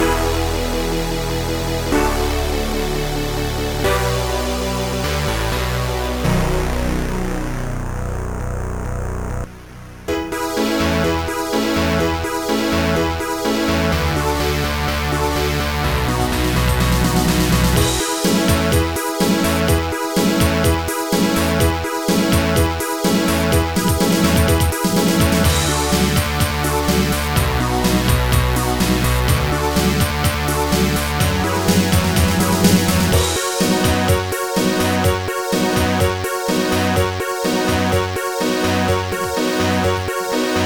piano fantastico; Trackers; Modland

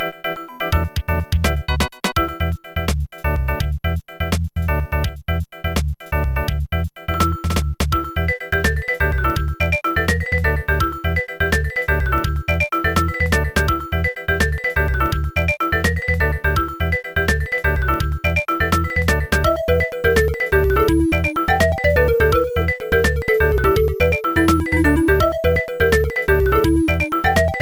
chiptune

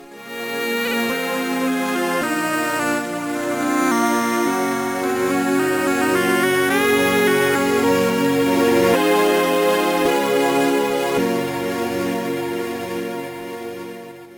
jingle smooth; Trackers; Modland

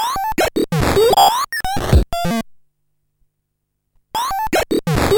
sfx; One Step Beyond

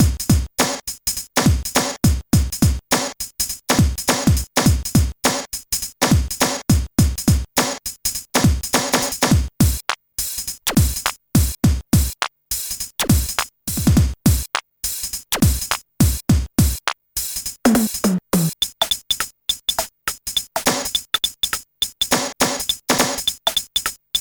the funky dance drummer; MED

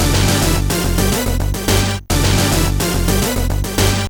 beatbox; Pinball Dreams

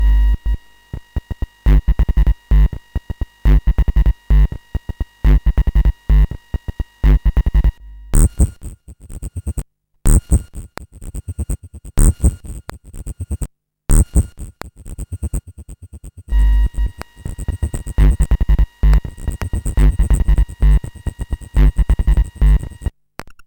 mod; robot vs morse

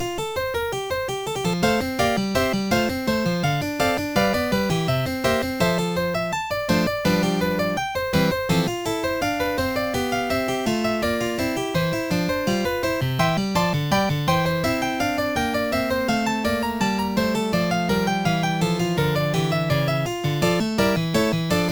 prelude; OctaMED MED

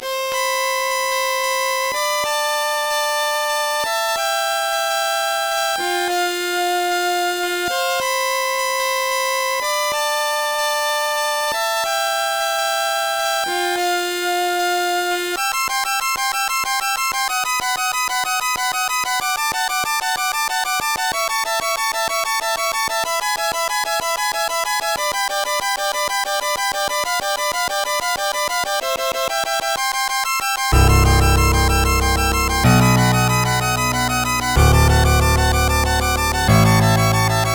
violin; MusicLine

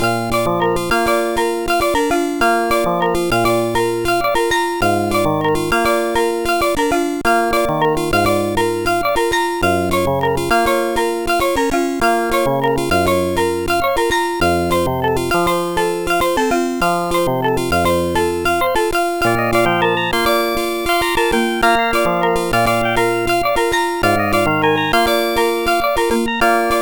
latin song; The Music Studio

